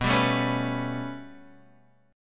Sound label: B 7th Suspended 2nd Sharp 5th down Guitar Open F, chord; B7sus2#5 strummed; JGuitar